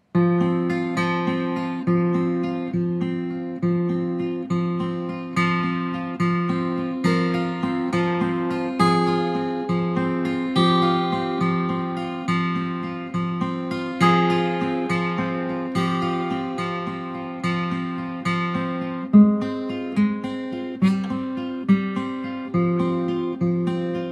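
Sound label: Music Guitar; Last Day on Earth Survival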